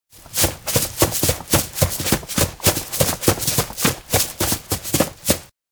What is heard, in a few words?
steps outdoor grassland escape; spica; anemoi